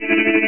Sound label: Glide; Buggy; PS1